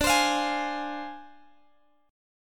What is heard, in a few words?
D-Minor 7th Flat 5th, D, Guitar, Open G